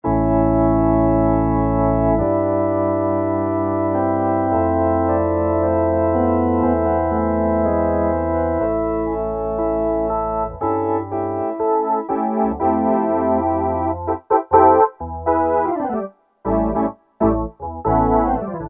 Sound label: Soft Organ